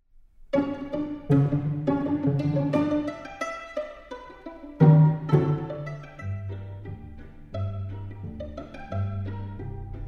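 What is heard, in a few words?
jazz pizzicato, Streichquartett; "Eine kleine Mozart-Revue" - Aus dem "Hinterhalt" 2021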